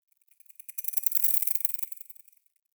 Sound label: Foliage Insect; Ambience; Props; Warframe; Duviri